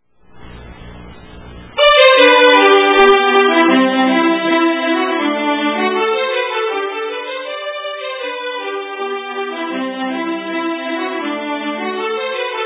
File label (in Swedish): Schottis; fraan Saetila